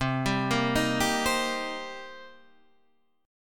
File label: D sharp 6th, C